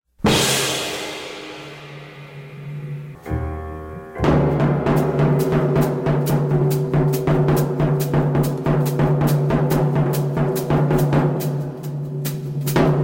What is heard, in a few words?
The Drum Thunder Suite